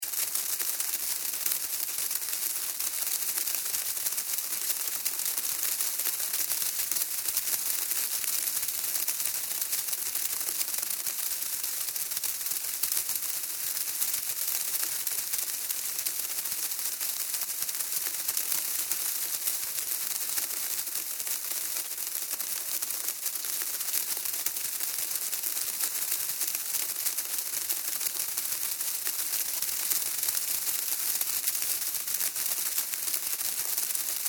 Termites, Termites, Colony, Activity, Movement, Insects, Bugs; Blastwave; Animals:Insects